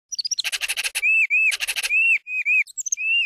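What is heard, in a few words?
laughs; Star Wars Knights Of The Old Republic II; XBOX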